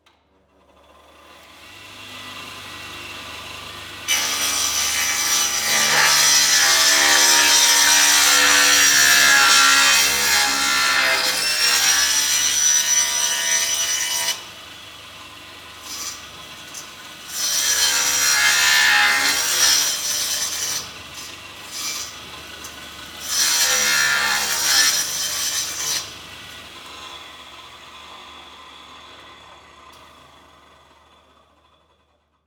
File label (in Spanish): SIERRA CIRCULAR MADERA Prende y apaga INTERIOR